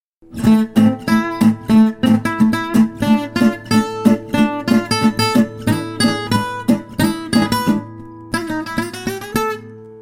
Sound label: outro, guitar